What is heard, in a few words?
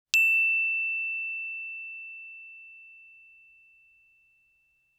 bells plastic ff E7; Percussion